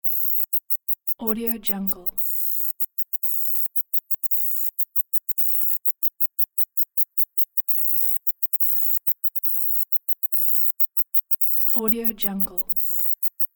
summer chirping insect

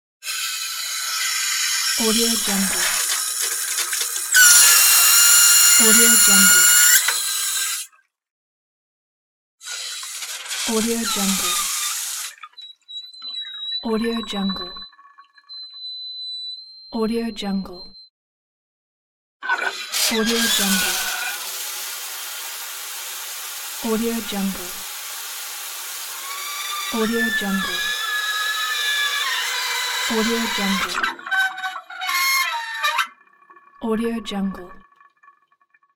faucet noise

